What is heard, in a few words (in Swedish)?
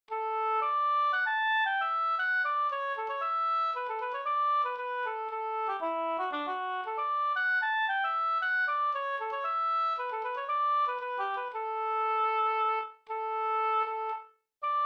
polska Systerpolska; Orsa